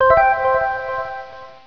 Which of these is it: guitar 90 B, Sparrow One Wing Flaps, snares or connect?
connect